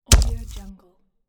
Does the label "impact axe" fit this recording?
Yes